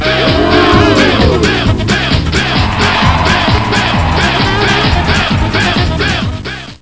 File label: cheer; cstrike; kickball